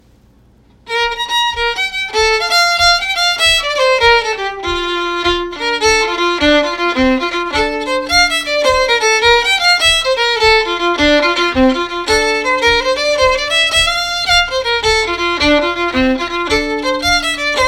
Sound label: Jig Set